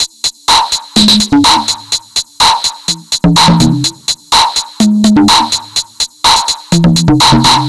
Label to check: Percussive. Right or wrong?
Right